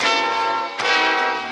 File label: horn twi